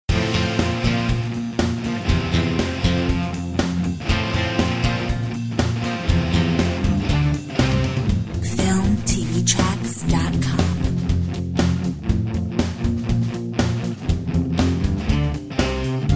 Rock sound

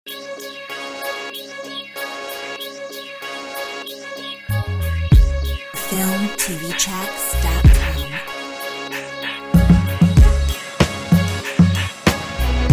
Hip hop impact

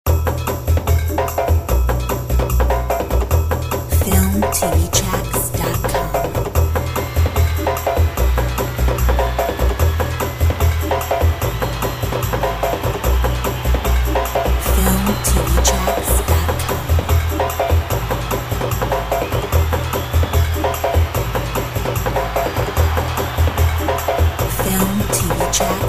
Brazilian drums

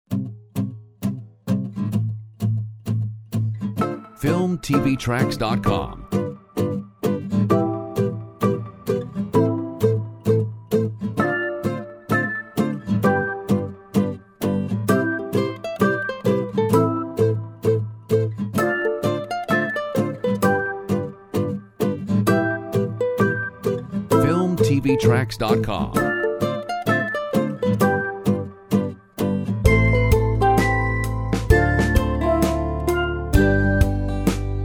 Ukulele Island

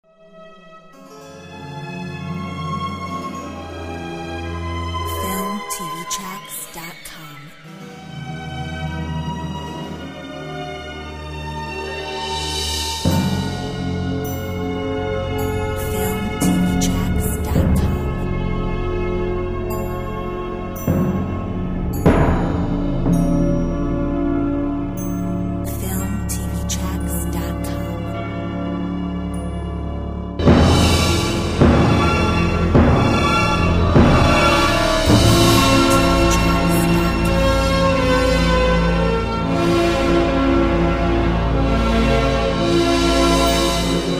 suspense

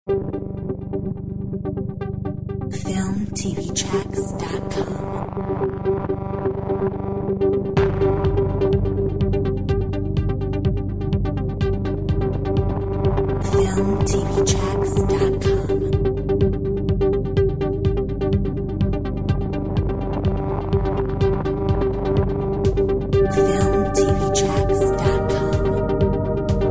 Industrial zone

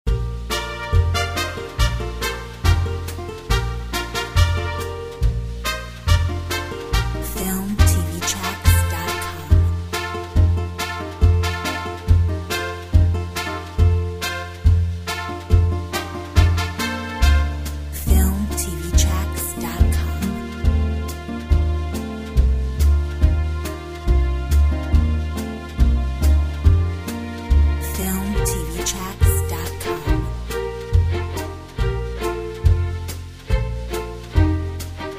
Mariachi

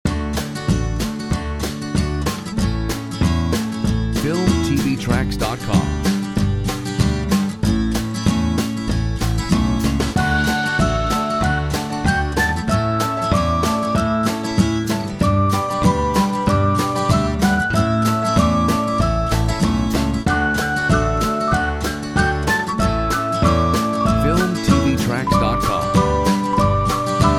Fun kids Ukulele

